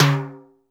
Timbale L, Standard Kit, Yamaha PSR-E323

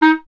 Clarinet D#0; Miroslav Vitous - Solo Instruments VOL-1

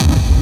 hard bass drumz

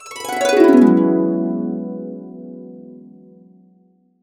E, Harps; USB Soundscan vol.62 - Complete Harps; AKAI

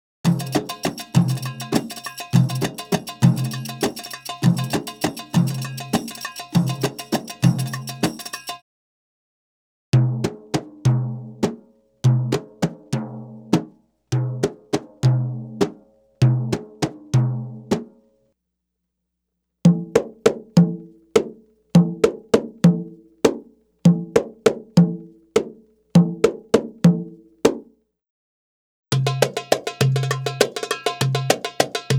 Arabic Rhythms

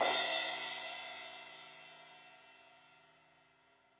Crash 16in edge, Cymbals; Deeply Sampled Drums

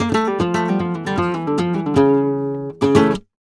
Remates De Pulgar, WAV Guitar, Flamenco

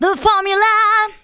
VOCALS SUNG; Techno Trance Essentials

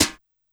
Brush Slap L, Yamaha PSR-E323, Brush Kit

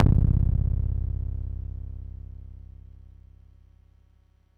PAFMBass c1, Yamaha YX81Z

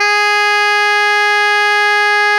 SOP FF G#3, Saxophones; Greytsounds; Philip Wolfe David Crozier's Saxophones

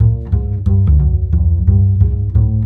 ACID JAZZ; Best Service; Advanced Media Trax Modern Composer

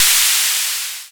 Dr 55 Hiss; Zero-G; Soundclash; Acidized Wavs

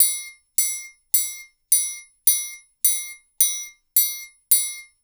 loop, percussion; InVision Interactive; Percussion Slam; ZOMBIE

